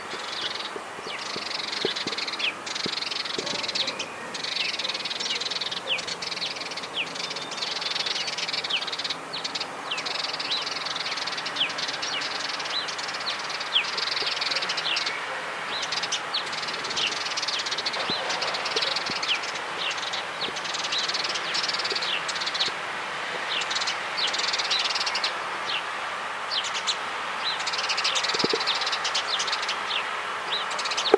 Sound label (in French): moineaux domestiques; livry